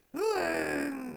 Mustache Sprout Amb Vocal; Friendly; Sproutfolk; Fey Hub Sprout

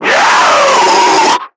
Screamer Death; Warframe; Lasrian

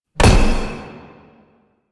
Bash Hit; Warframe; Warframes; Hoplite

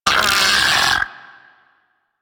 Arachnoid Vocal Player Spotted

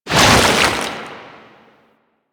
Chain Reaction Cast; Warframe; Warframes; Qorvex

